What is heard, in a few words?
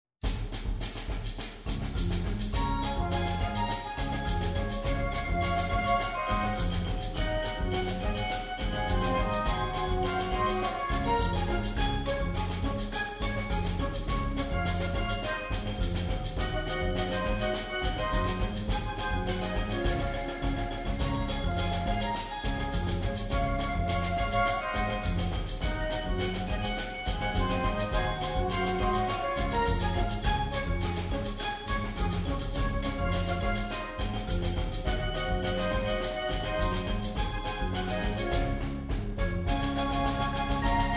Hits For Marching Band